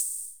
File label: flip piece; iagno